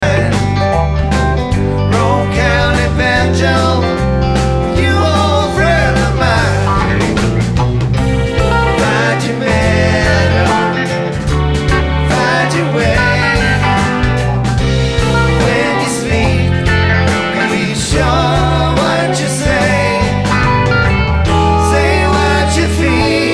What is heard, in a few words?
Banjo